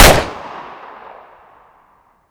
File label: smg1 fire, weapons; hl2dm; npc